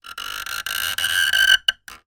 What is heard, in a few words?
wood pole turn squeak; foley; zapsplat